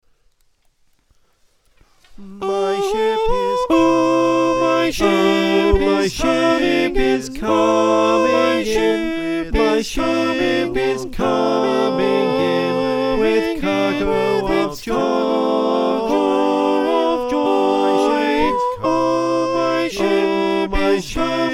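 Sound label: soprano alto tenor bass, choir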